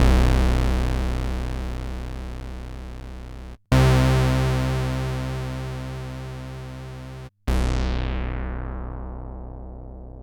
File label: MC202 Bass